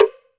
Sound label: Perc, Alesis DM5